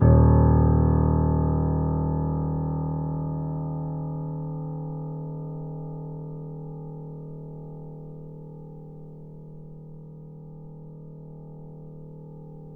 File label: PP D#0, Grand Piano; AKAI S6000 CD-ROM - Volume 2; Grand Piano 256M